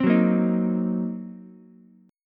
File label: Eb Minor 6th Ukulele, Ebm6 chord; strummed